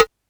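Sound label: Cowbell, Yamaha DD-6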